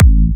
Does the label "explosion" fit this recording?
No